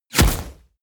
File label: bullet small impact wood scatter; krieg-waffen; geschoss